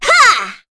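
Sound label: Vox Attack, korean; Cecilia; kingsraid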